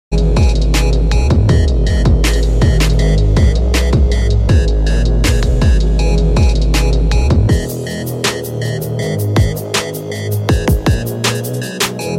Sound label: Modern beats